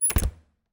Refridgerator, old fashioned, wooden, steel, brass clasps, hinges, close, squeek, piercing, wide; Eiravaein Works; Vaeyan IV